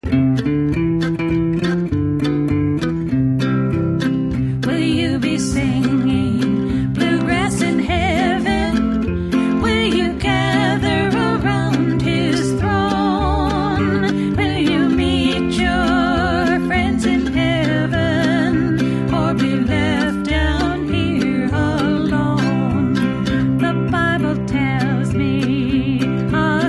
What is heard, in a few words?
Singing Bluegrass